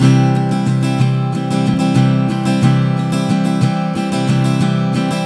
091 Pop Ac-Guitar Strum Cmaj; Pop Rock; Acoustic Guitar; Pro Tools Essential Loops; Digidesign

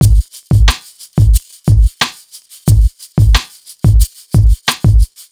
Hip Hop Beat, Drums

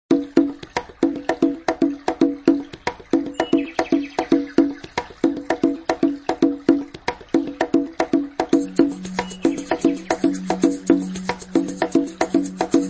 Rainforest Beat (African Percussion Jungle Beat); Relax and Meditation Spa

